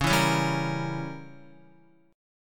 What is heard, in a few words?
C sharp Diminished 7th C sharp down Guitar 4F 4A 5C 5G 5C 6E; C#dim7; strummed; JGuitar